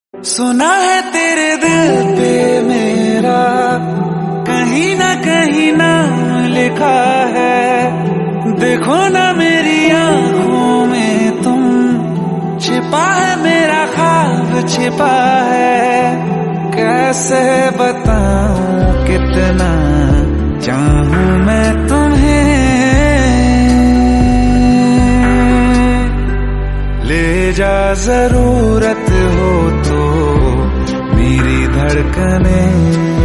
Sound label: new hindi ringtone